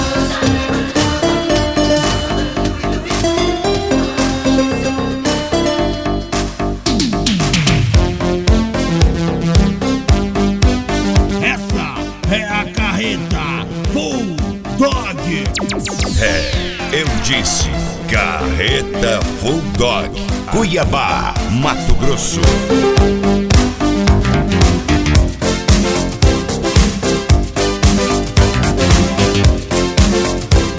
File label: flashback